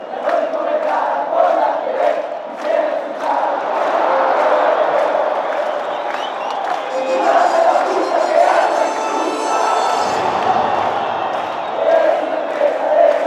atlas chant

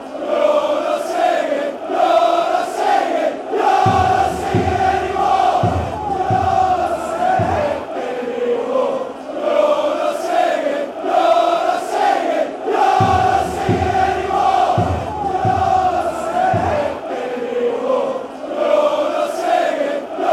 youre not singing anymore, wales

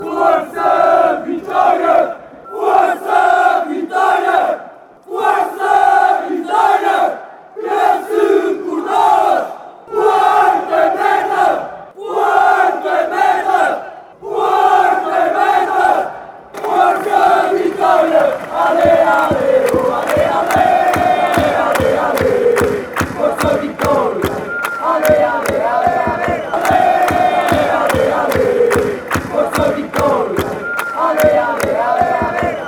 forca vitoria shout mix, chants; vitoria sc

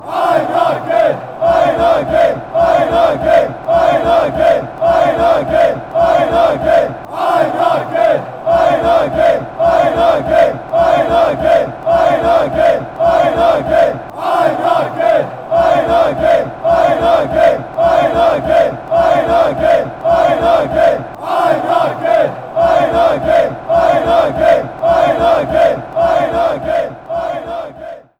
chant; b moenchengladbach

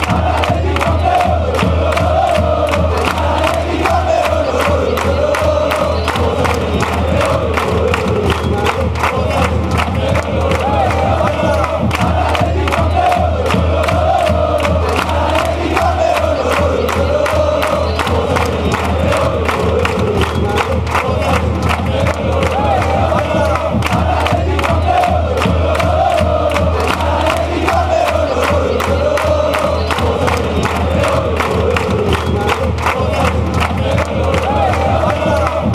campeon, fan chants; aleti

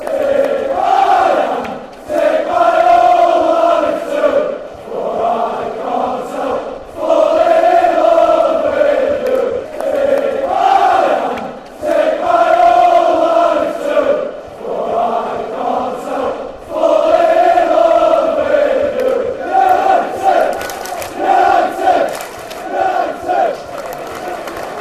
fan chants; sufc

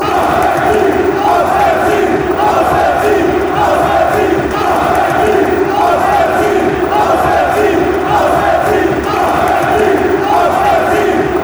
away win, chants

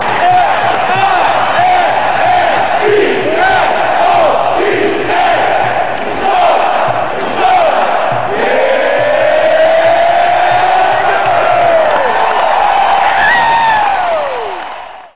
fan chant; minnesota

